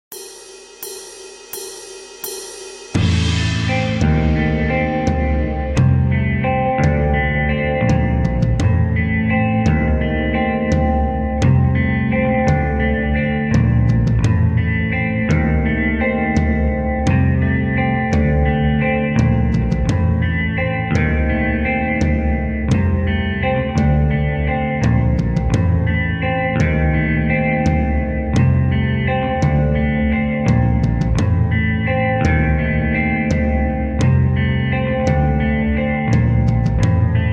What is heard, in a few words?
Locrian in A